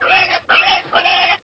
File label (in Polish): świnia